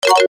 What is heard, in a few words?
Multimedia Notify, Technology, Multimedia, Notification, Element; Alerts